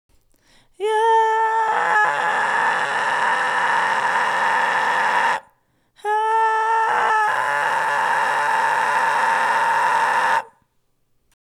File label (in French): Creaking m2 irregulier; les effets simples